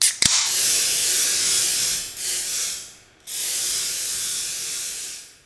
can spray

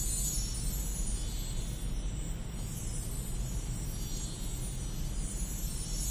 Star Ambience; Angry Birds 2

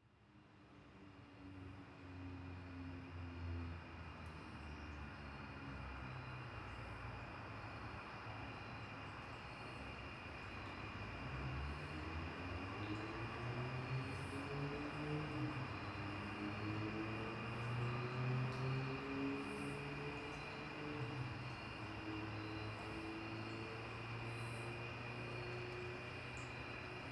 amb generic outside day; Lucius III

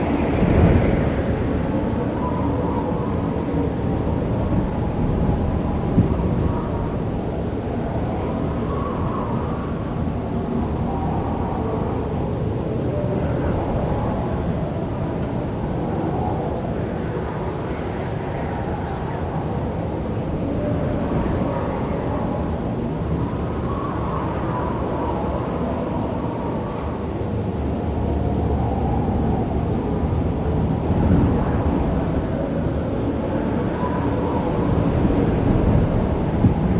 Wind canyon

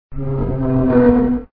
Door Creak; Super Mario 63

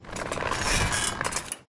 Rope Swinging; Poppy Playtime; Extendo Hands

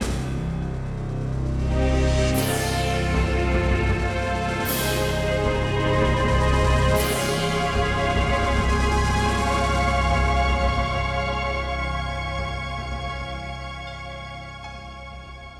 Fanfare

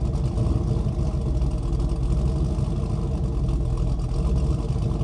Neutral Engine

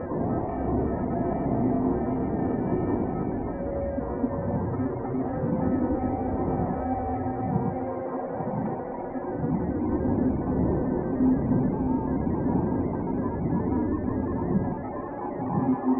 Danger Tonal; Insanely Twisted Shadow Planet

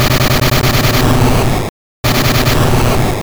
Self Destruct; Pokemon; Gen 2; attack moves; GSC

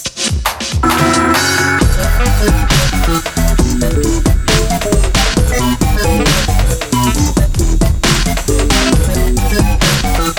dubstep; milestone sound collection; ukiha shopping mall

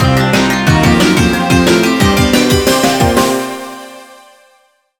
Beat The Stage Boss Fanfare; American Dragon Jake Long Attack of the Dark Dragon

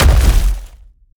Hand Hit; helltaker

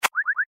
Swipe Activate

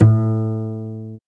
A Bass

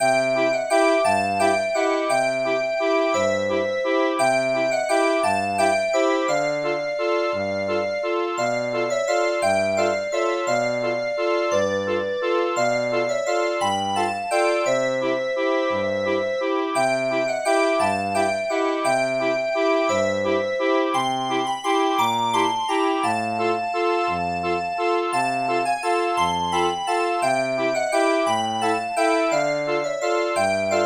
Music Box House; Legend of Zelda The Majora's Mask 2000 n64

